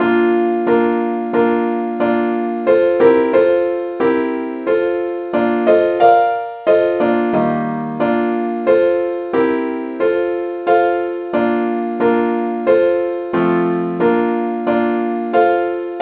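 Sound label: saint thomas simple acc key b flat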